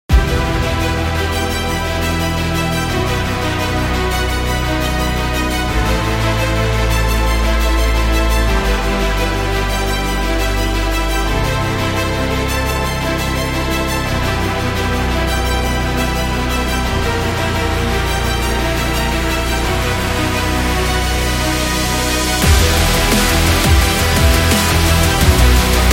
Heroic; epic sport metal

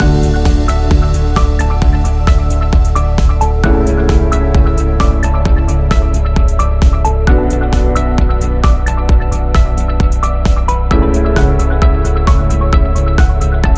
Corporate Positive Loop

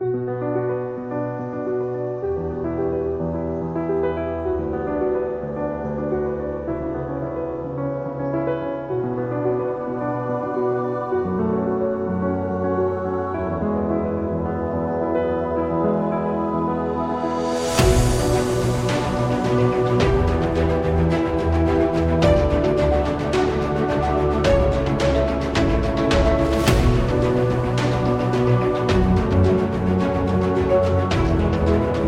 Epic Dramatic

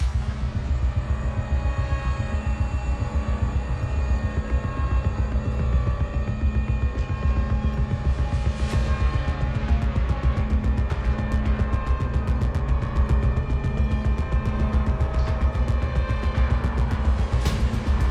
Suspense

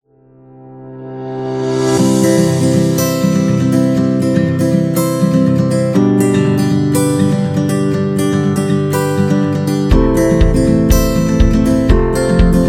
Uplifting Acoustic

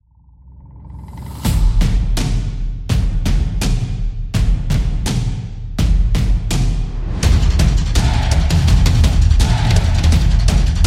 The Sports Drums

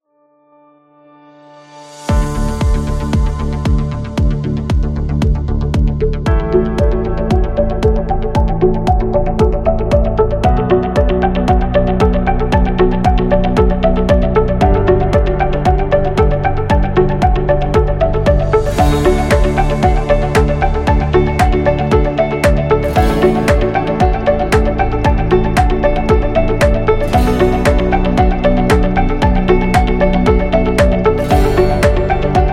In Corporate

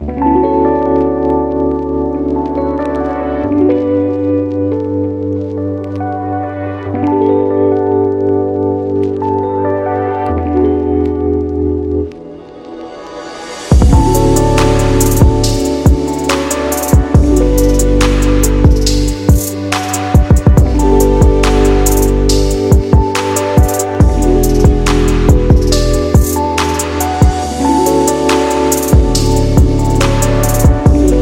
Lofi Hip Hop

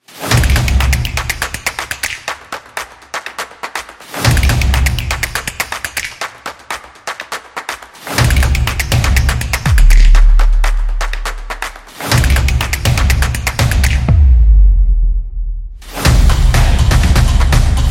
Sports Upbeat, percussive

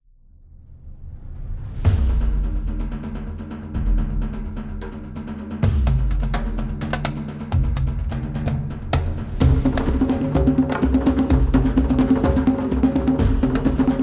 Middle East Drums

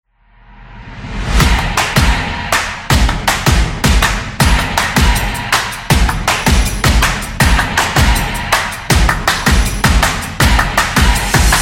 Opening, sport drums

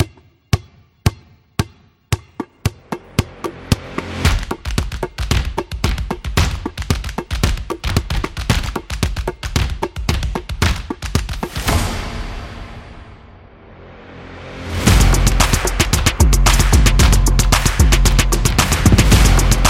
Basketball Intro

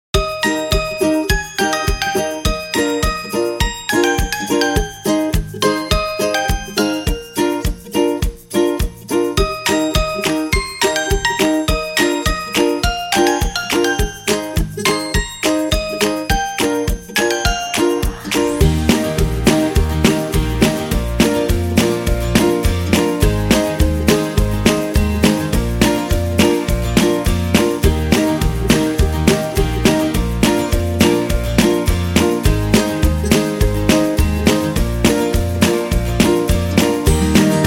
Kids Happy, happy music

